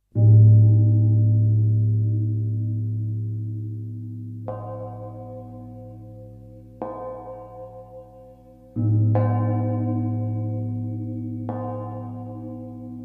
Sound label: Group of gongs and bells